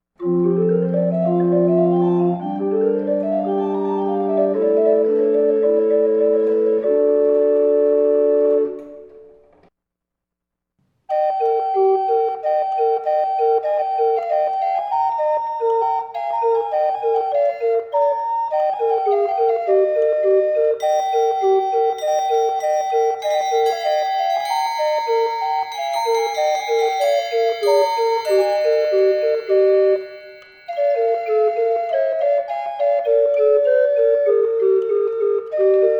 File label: medley of old organ clocks